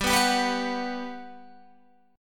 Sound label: G Major 7th, G, down, Guitar, Open G